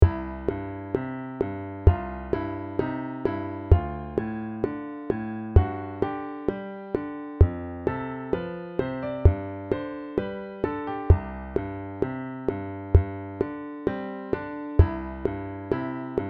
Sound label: walking bass metronome c major